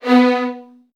B1, VN 16TH, Virtuoso Strings; ILIO; Kirk Hunter

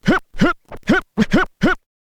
scratch short, Vinyl scratches

